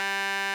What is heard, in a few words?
RMI EP; Sonic Reality - Vintage Timetraveler